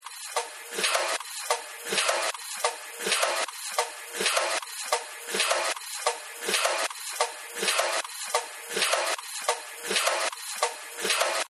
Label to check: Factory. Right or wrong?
Right